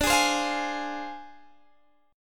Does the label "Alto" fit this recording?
No